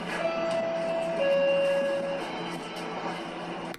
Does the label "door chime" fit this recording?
Yes